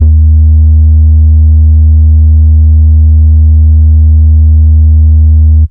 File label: fat sub, Kicks Heartbeats